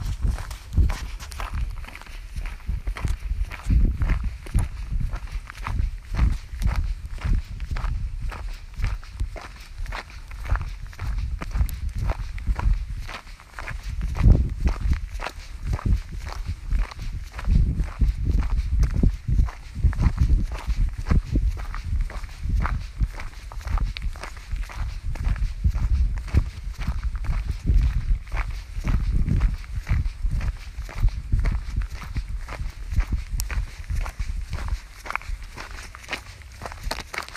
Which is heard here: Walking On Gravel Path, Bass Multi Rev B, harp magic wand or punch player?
Walking On Gravel Path